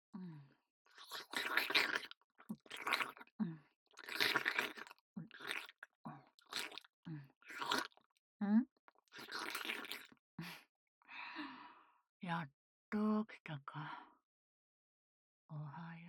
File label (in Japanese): フェラチオアラーム